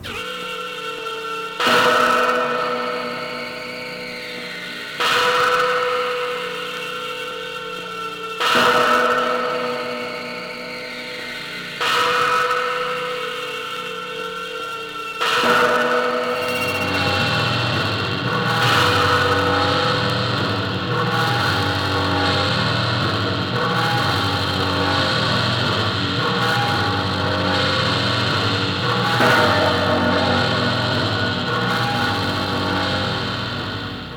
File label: tape loop collage